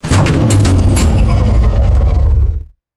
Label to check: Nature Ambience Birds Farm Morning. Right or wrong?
Wrong